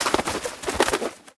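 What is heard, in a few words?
battle Beak damage; Seer; GamigoNA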